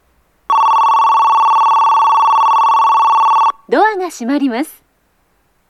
bell, 発車サイン音 ベル; 東急東横線; 4番線 渋谷・和光市・飯能・森林公園方面; 扉閉め放送は、ホーム上のJVCから流れます。; 中目黒駅

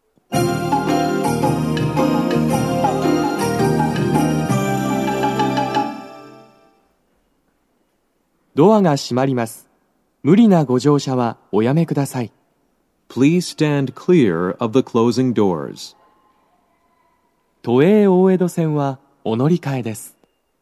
発車サイン音, BOSE天井型での収録です。; 2番線 中野・三鷹方面; 門前仲町

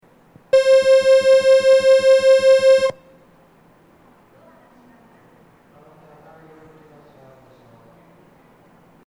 発車サイン音は、「営団ブザー」です。中程のBOSE平面型にて収録しました。; 7番線 中目黒方面

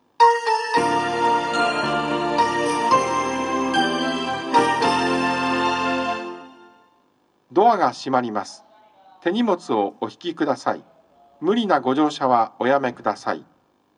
hasyya, 発車サイン音; 2番線 中野・三鷹方面; 東京メトロ; 東西線; 南砂町

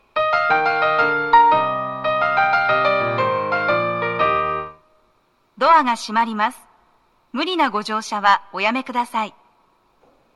発車サイン音, ピアノ; 1番線 代々木上原・唐木田・伊勢原方面 発車サイン音; tokyometro chiyodaline nogizaka; 1番線がイントロ

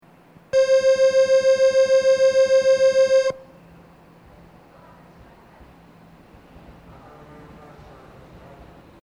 発車サイン音は、「営団ブザー」です。後ろ寄りのBOSE平面型にて収録しました。

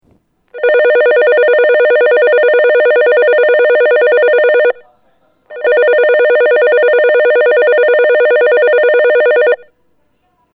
bell, 発車サイン音 ベル; 東急東横線; 1番線 元町・中華街方面; 中目黒駅

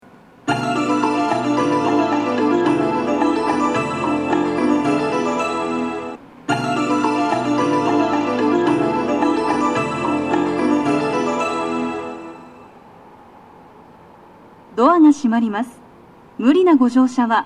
発車サイン音, 両面箱型での収録です。; 門前仲町

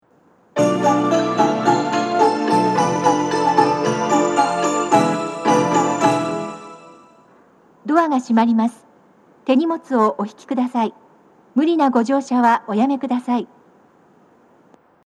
発車サイン音; 1番線 西船橋・東葉勝田台・津田沼方面; 木場

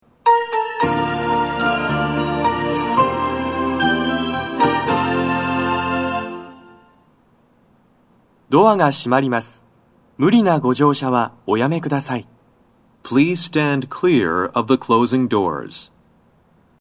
hasyya, 発車サイン音; 2番線 中野・三鷹方面; 南砂町

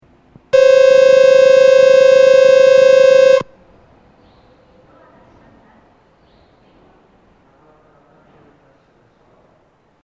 発車サイン音は、「営団ブザー」です。後ろ寄りのBOSE平面型にて収録しました。

